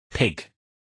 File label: pig